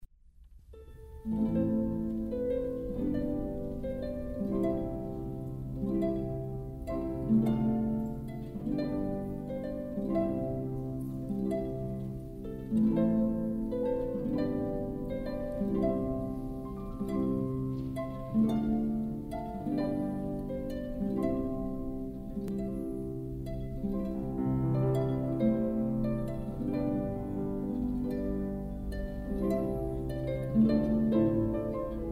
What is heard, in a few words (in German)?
Harfe Klavier